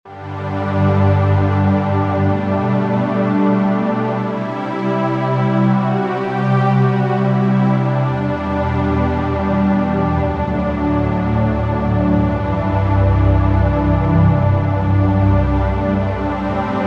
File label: Underscore PAD; Worship Flow